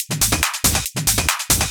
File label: Loop 140 BPM; vengeance essential club sounds-3